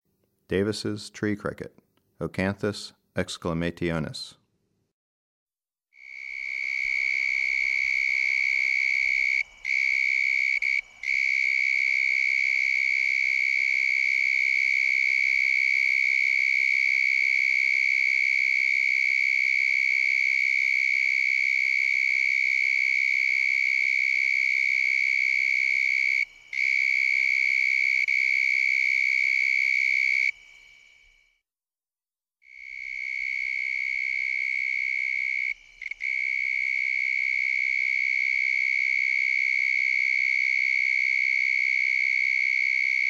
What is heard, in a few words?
Davis' Tree Cricket, Oecanthus exclamationis; Crickets and Katydids